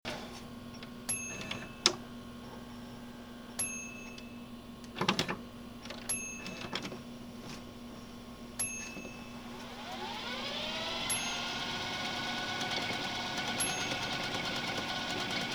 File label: Vcr Rewinding and Fast Forwarding